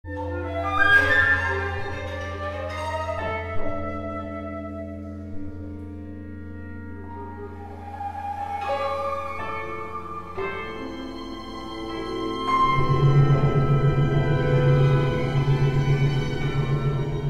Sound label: only music